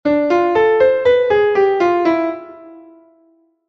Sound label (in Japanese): アプローチノート と コード分散 の フレーズ